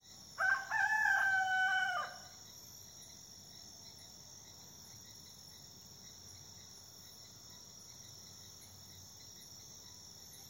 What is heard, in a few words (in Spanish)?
AMANECER AMAZÓNICO GALLO TAMSHIYACU IQUITOS; Amazonas; amazonía